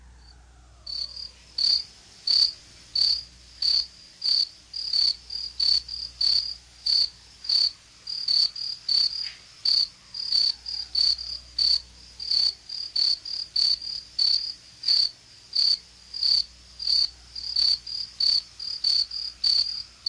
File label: crickets